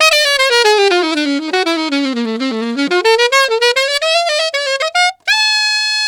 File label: Alto in Ab, Alto Sax; Sonic Foundry (Sony Creative Software)